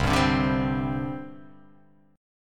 C Suspended 2nd, C, down, chord; Csus2 strummed

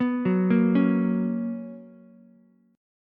B Major 7th Suspended 2nd Ukulele, chord; BM7sus2; arpeggiated; JGuitar

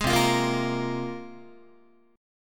C 7th Suspended 4th Sharp 5th, Guitar

